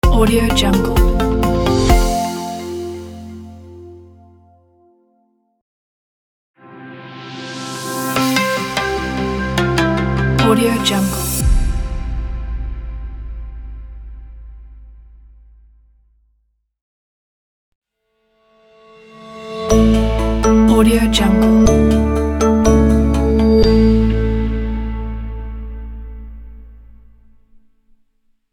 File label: positive corporate intro logo pack; boxbeats